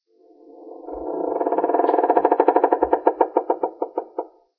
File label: Creak; Five Nights at the Chum Bucket